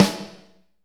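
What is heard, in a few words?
snare; Northstar - Drumscapes Roland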